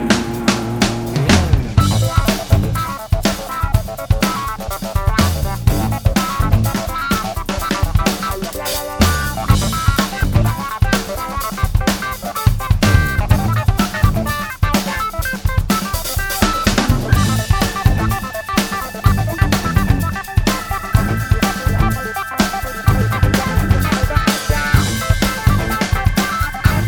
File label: wah clavinet